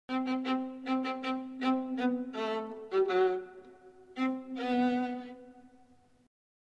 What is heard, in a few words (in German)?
Viola